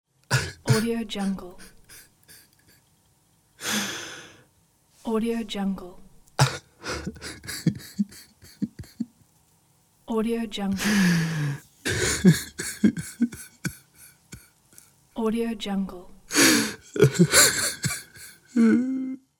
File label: male cries